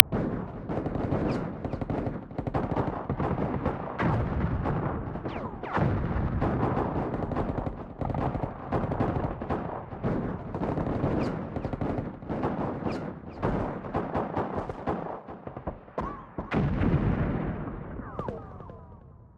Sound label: gunfire, ambient